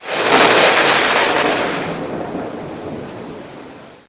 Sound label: thunder